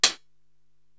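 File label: HH Dirty Tekno Close, hi hat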